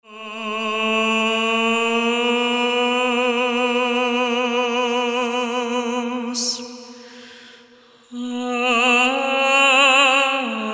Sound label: Voice Quartet; Hristos Anesti; florilegiu